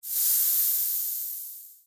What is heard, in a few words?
Steam; Candy Crush Soda